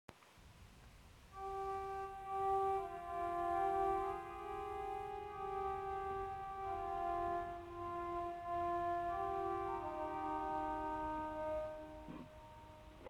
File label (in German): Gambenreihe 16 8 4 Viola da Gamba 8, orgel